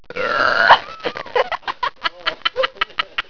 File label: giggler